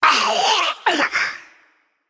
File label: Naga Emote Death; Parched Desperado